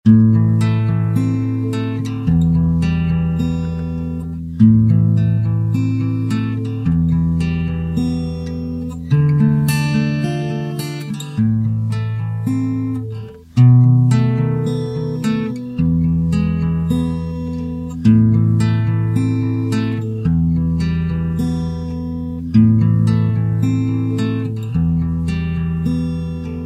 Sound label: guitar song